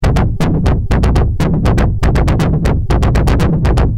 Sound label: DRUM